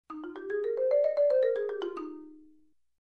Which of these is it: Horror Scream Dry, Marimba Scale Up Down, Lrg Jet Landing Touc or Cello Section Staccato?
Marimba Scale Up Down